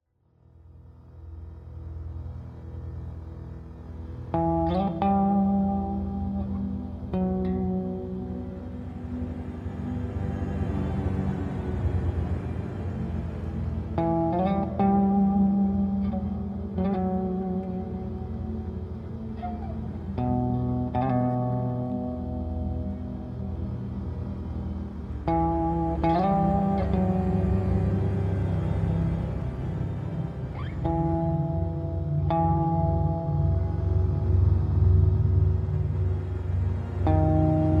Dark Bass and Pads Low Pad Version

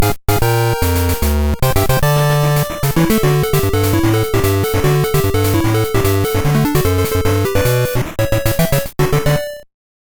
Engine Component Recovered!; bomberman quest; gb

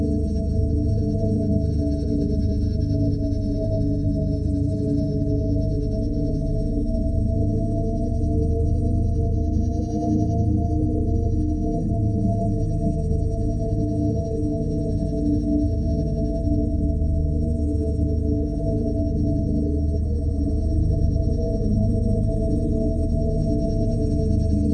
SlowMo Loop

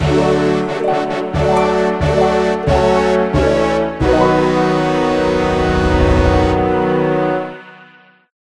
Slayer level up

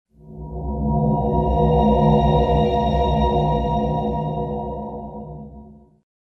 Transition; Crater; Ratchet and Clank

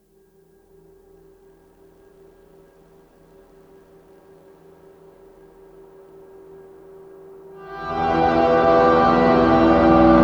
Shortwave Noisetest

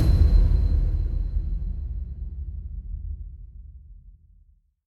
perc spot

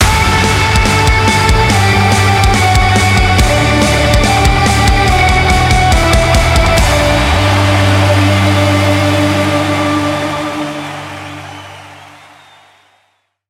Prize Silver Jingle

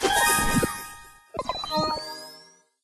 Collect Money; Little Big City 2

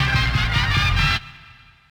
Continue Jingle; The Irritating Maze; SNK Neo Geo Arcade

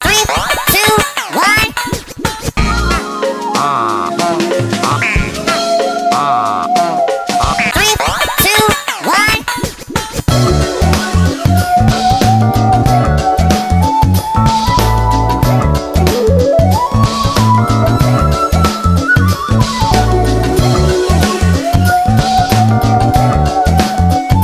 Battle Mode

